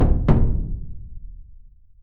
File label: flag dropped